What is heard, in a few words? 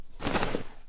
backpack